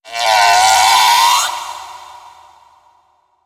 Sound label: Critter Female Verb; Kahlo; khlo Critter Female